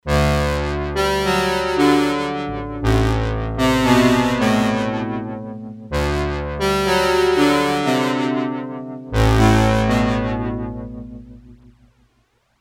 Roland JX03 Cross Mod Sound

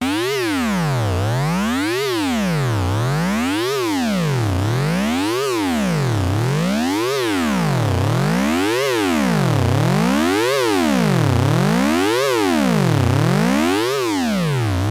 Korg MS20 Ringmodulation LFO VCO1

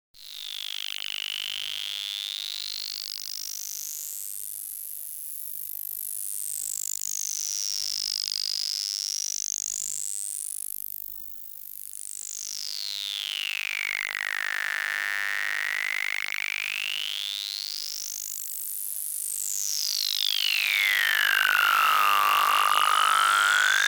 4 voice dry HPF

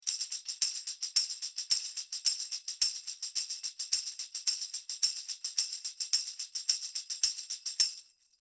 Tambourin close

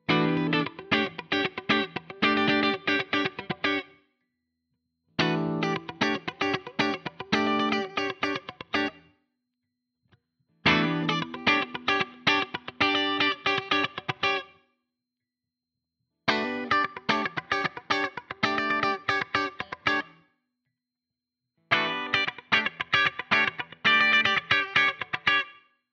FRM300 PR clean Funk

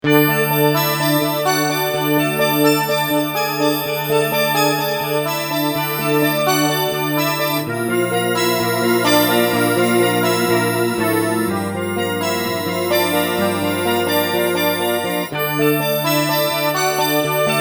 Sound Mode Crystal

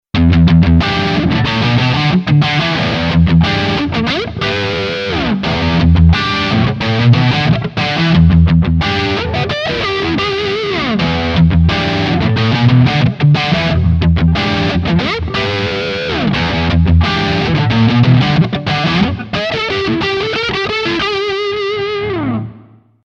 Neck SC Highgain Rhythm; Schecter Nick Johnston

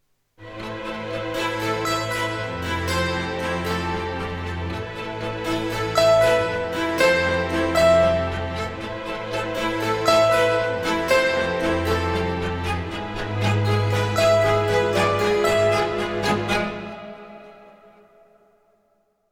Fantasy Strings

